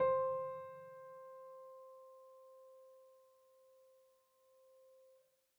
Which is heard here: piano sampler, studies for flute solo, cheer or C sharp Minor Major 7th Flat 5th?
piano sampler